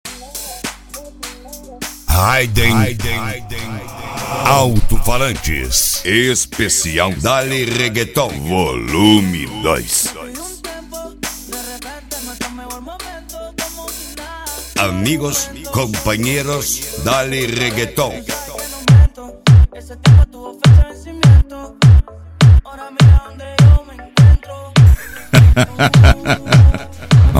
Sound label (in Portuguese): dale reggaeton